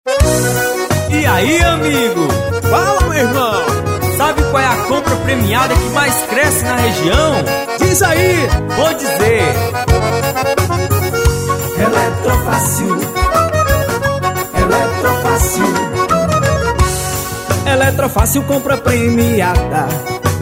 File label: JINGLE